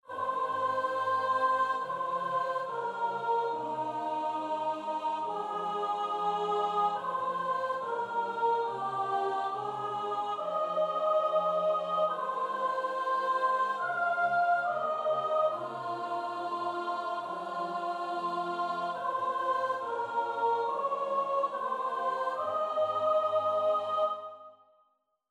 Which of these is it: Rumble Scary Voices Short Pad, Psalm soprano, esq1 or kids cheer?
Psalm soprano